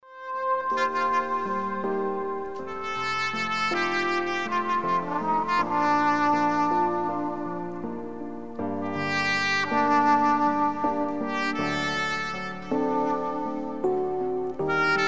sax; araras

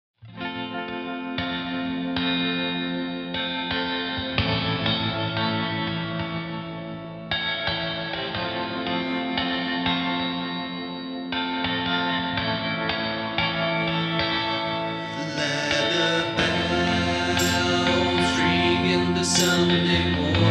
bells

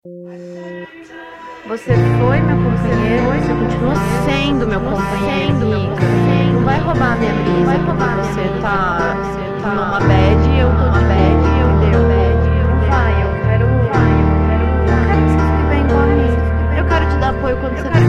cellos

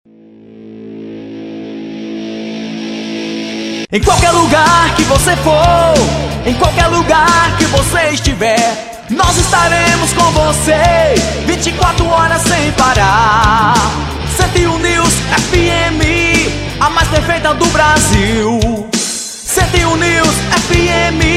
jingle; 101 neews fm

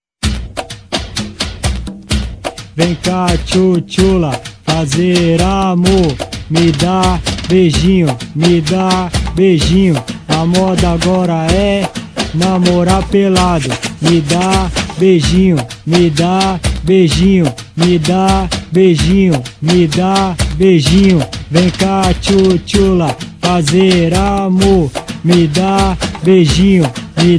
funk

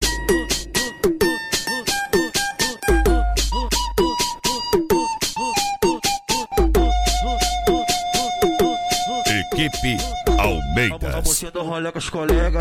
funk

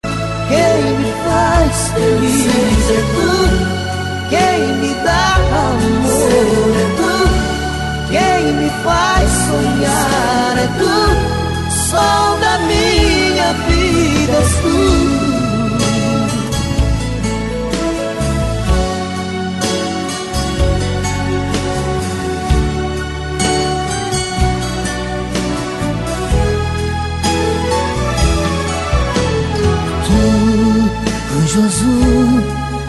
strings

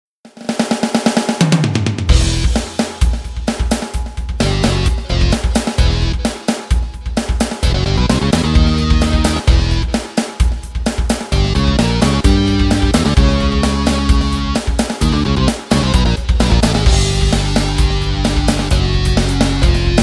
fast groove

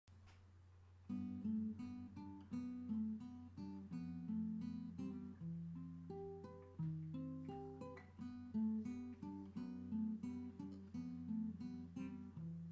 instrumental

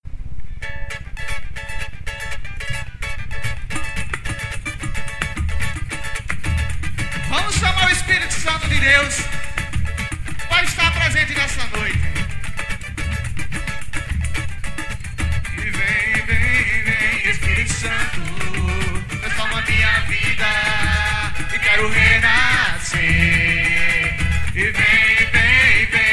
samba

